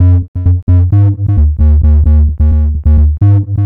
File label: Synth Bass; Basses